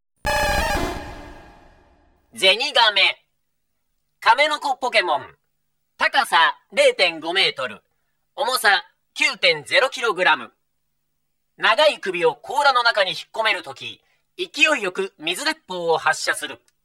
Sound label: squirtle, Pokemon Gameboy Sound; Pokemon Gameboy Sound Collection